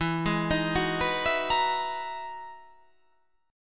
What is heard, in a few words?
E Major Sharp 11th E